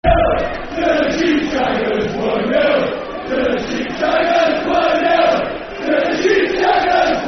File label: Football Chants; Cardiff City; fanchants-com